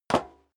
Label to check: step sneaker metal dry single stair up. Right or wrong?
Right